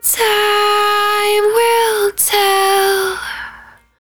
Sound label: 110bpm Ab, Velvet Vocal; Zero-G